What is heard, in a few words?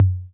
Tom Low B 808 Tape, Clean